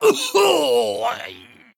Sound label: Male Dwarf Death